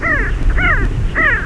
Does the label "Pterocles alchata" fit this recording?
Yes